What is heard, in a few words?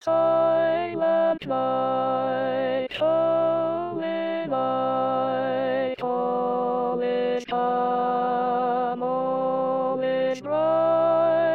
Altos